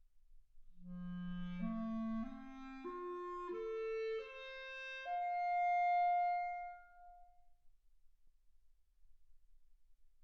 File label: clarinet